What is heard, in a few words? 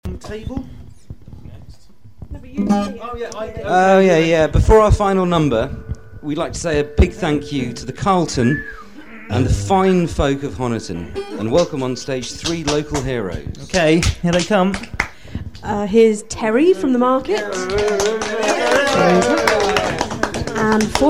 Whistles And Bells And More Whistles